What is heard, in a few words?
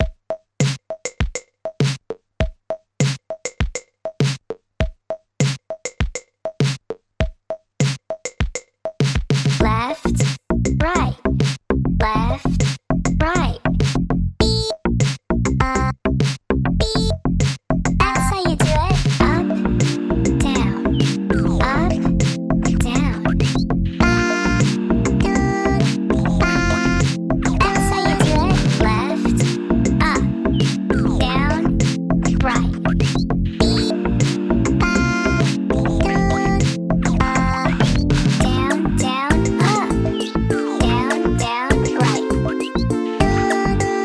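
instrumental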